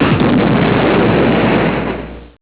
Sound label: explosion lrg; outpost